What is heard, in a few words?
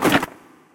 chest open; PixelPerfectionCE; minecraft